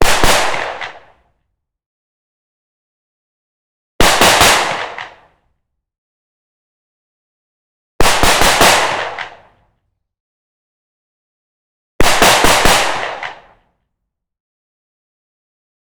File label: SAIGA-12 12g solid slug 55m in front off axis clean Bursts x 7